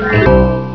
New Level; MacPipes 2.2.3

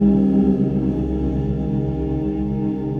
VOICEFX ETHNO 160; eJay

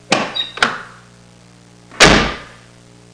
OPEN SHU, TRANSPO; Easy Personal Web Page

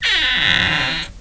doors

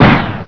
SmashHit

